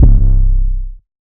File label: 808 Short (C); Cymatics; Orchid Sample Pack; 808s & Basses